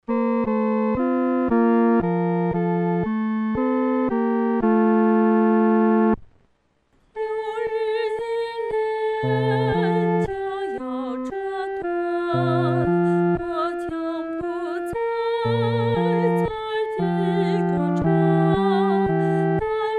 独唱（第三一声）

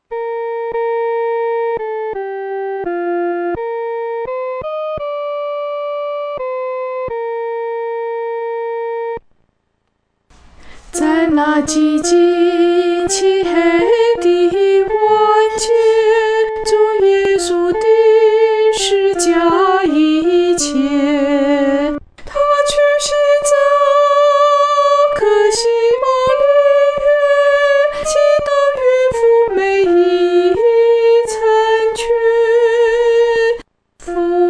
独唱（第一声）

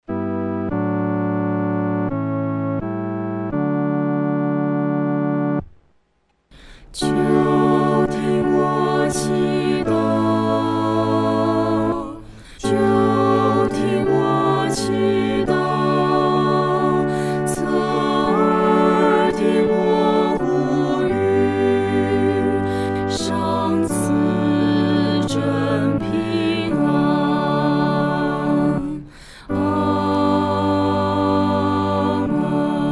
合唱 四声部; 垂听我祷告

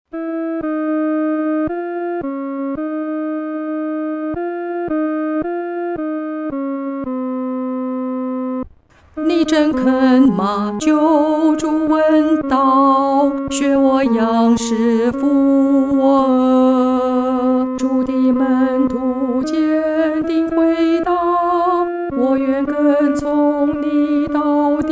独唱（第二声）

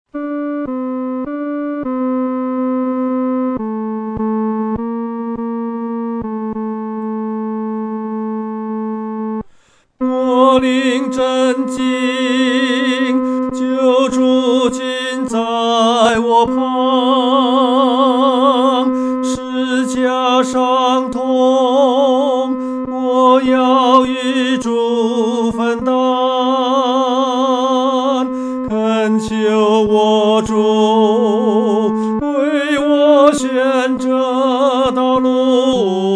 独唱 第三声

